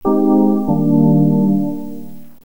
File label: Lose game